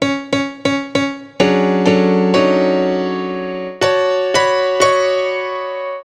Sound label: Piano Solo, Cinematic Funk; Sony Creative Software; Urban Tone Poems; Solos